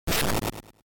General Noise

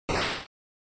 NES General Noise Phrase

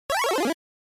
NES RPG Magic Status

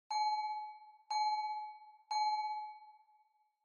Fire Truck Siren Fake Reverb Bell

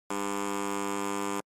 Quiz Buzzer Long

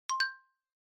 Xylophone Multi

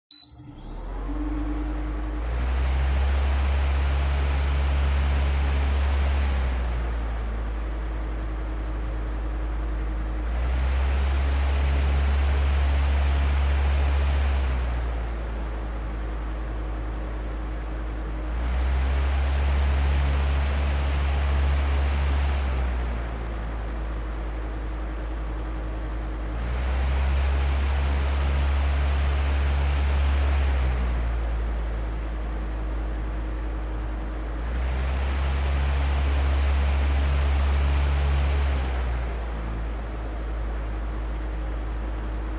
Electric Fan (Rhythm High)

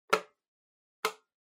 Tabletop Timer Digital Button; 卓上タイマー デジタル01-03(ボタン); タイマー機器

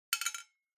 Drawing Machine Garapon, Drop

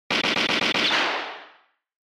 Censor Bleep Gun

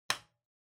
Light Switch Box Rocker Wall Mid

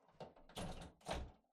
Air Conditioner (Far Panel Close)

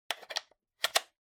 Outlet Timer Plug In; コンセントタイマー02-12(挿す); タイマー機器

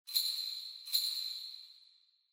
Ring Bells Reverb Phrase

